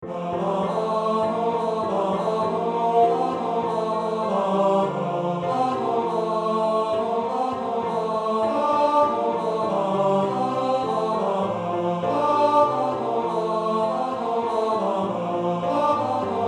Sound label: Tenor; Infant Holy Infant Lowly